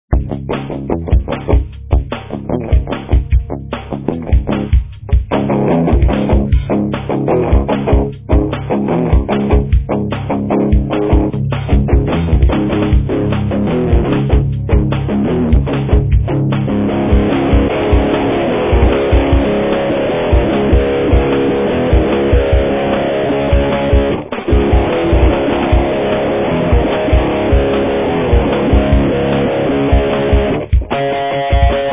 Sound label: both pedals